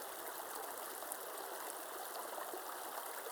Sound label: Waterway G Light